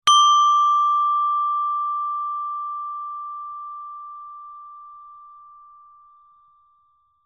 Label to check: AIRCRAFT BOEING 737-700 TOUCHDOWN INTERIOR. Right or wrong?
Wrong